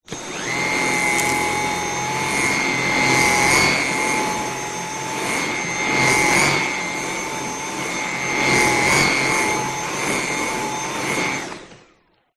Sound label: household vacuum cleaner vacuuming close up